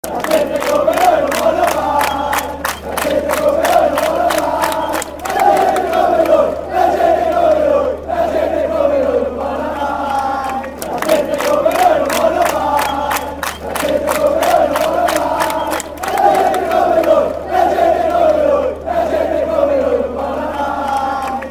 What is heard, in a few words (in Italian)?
La Gente Come Noi, cori curva nord